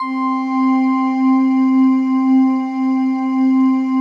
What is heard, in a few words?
PAD; Best Service; XX Large; Pads